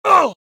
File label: Male Fight